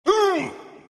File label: pain; invoker